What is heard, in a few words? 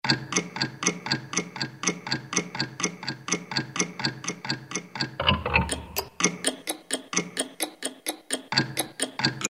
clock; Roland SN-U110-02